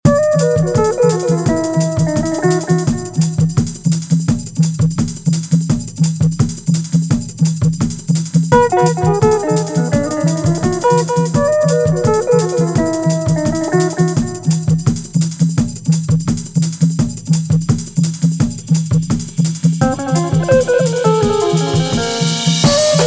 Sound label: Jazz Sound